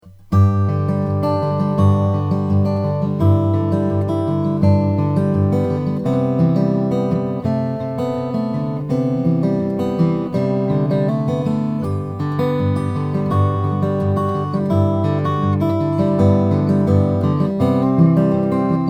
noemi the wedge exotic ebony

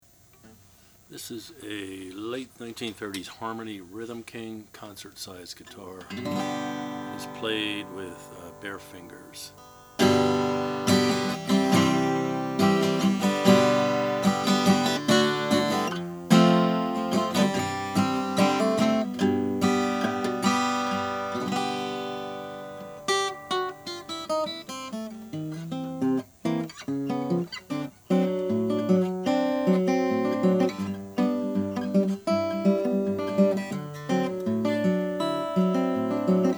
harmony rhythm king tobacco sunburst

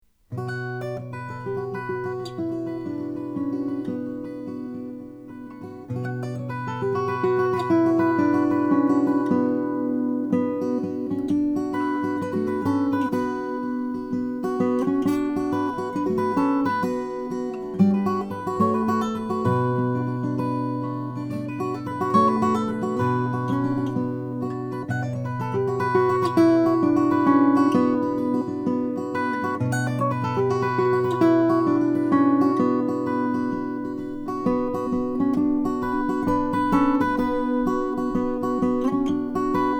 applegate sj madagascar

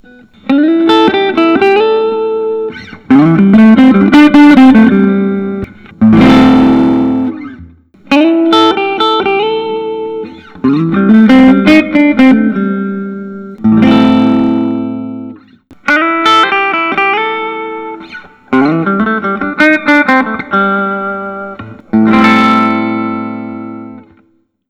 Gibson Memphis 63 ES 335 60s cherry

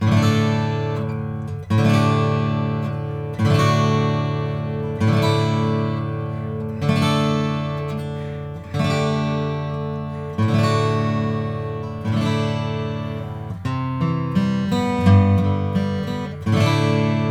Goodall traditional 000 12 f; natur

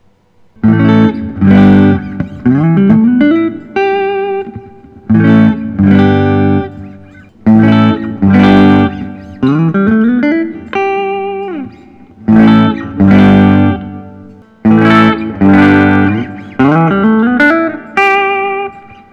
collings i 35 deluxe caramel

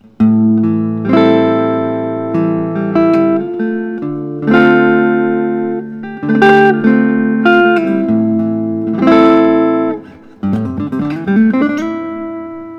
comins concert 16; shaded natural

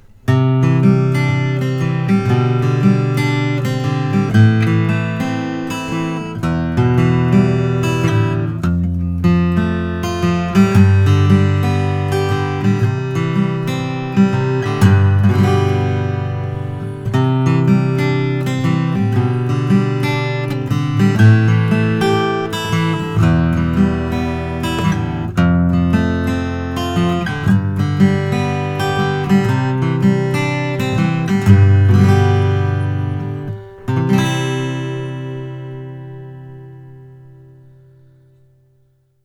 Bourgeois OMC custom

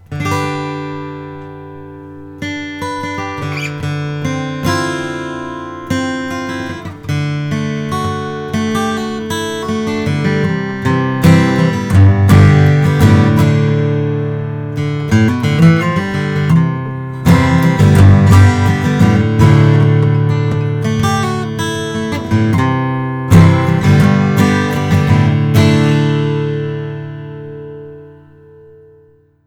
Goodall traditional OM natural

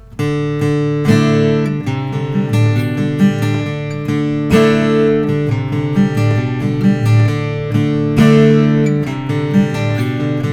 Martin custom 000 28VS deep body

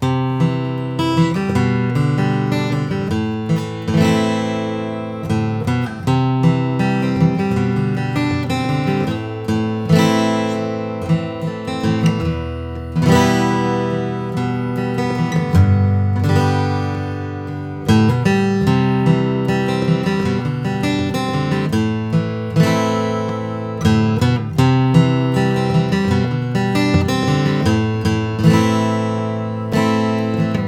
santa cruz om deep body; 2008

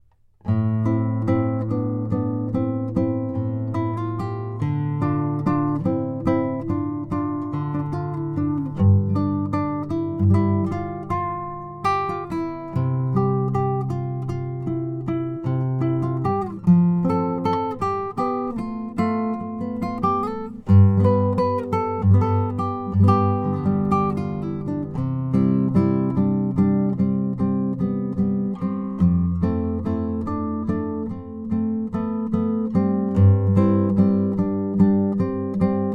goodall rxc german spruce top

